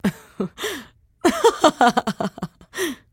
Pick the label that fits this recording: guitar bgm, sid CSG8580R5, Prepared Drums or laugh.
laugh